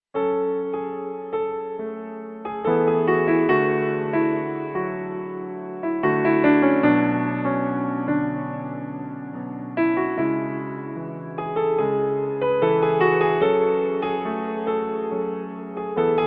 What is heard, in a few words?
piano song ringtone